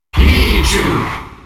Grito del público; Pichu; SSBM

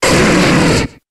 Grito de Arcanine

